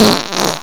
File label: fart